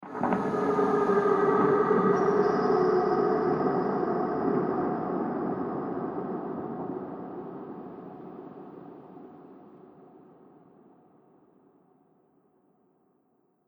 Werewolf Of Thunder, monster; Monster Sound Effects; Andrew Fleming

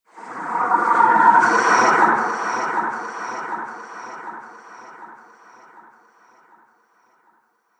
Unholy Terror Transition; Unholy Terror - Transition 2; Scary Soundscape Sound Effects; Horror Sound Effect

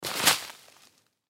Sand Bag Pick Up, Foley Sand Bag Pick Up; Playgrounds

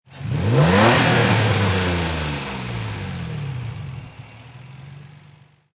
Nissan 350Z Engine Single Rev; Auto, Power Car, High Performance Car Sound; Engine perspective.; Car Sound Effects

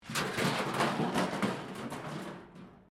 metal rubbish bin move concrete; Sound Effects:Materials

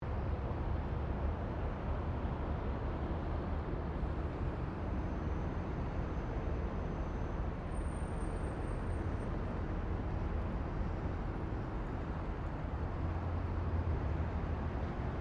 Traffic General Ambience; General traffic sounds; Traffic and Cars; Foundation4

